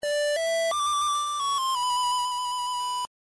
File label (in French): Sifflet; The Legend Of Zelda